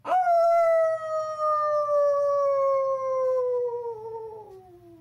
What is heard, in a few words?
awooo